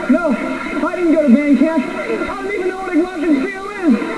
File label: glockenspiel